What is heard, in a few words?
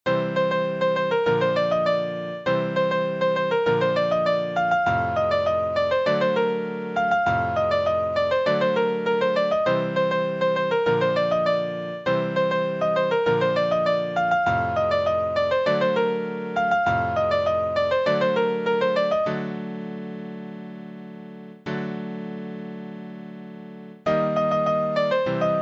Piano